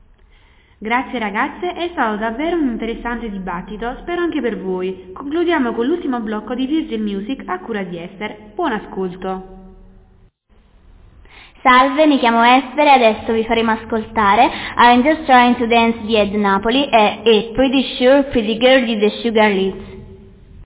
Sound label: music